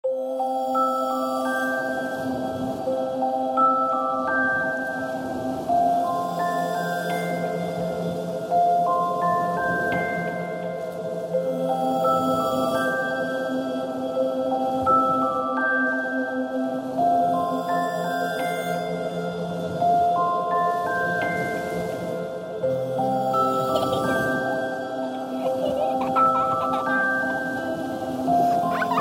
lullaby ringtone; zuk

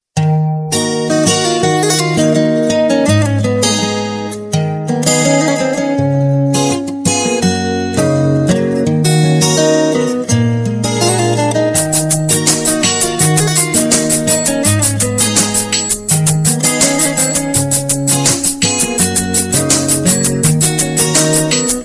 new instrumental ringtone; bollywood ringtones

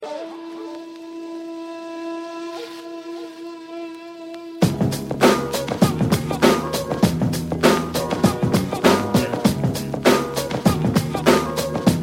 hip hop hooray happy music tone ringtone

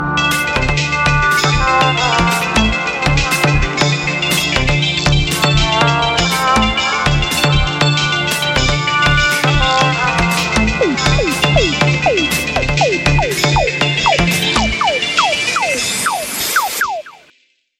xiaomi 12 pro ringtone

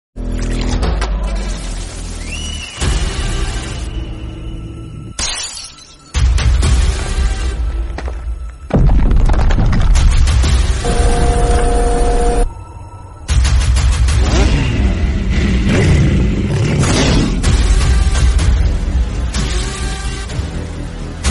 thrilling tone ringtone

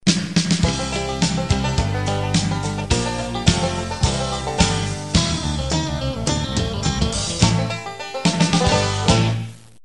rural guitar background music tone ringtone

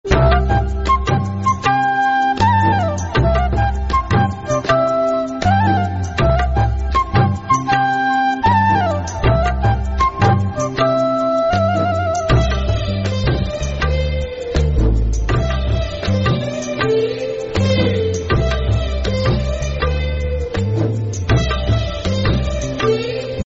karaoke instrumental ringtone; bollywood

